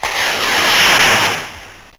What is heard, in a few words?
Rocket, Fairlight CMI IIe Machines